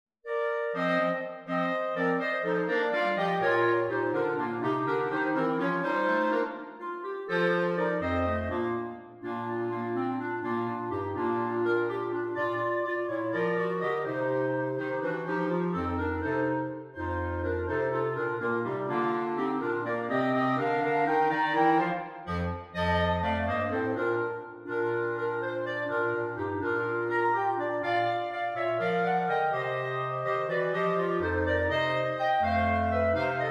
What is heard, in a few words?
Away in a Manger, clarinet quartet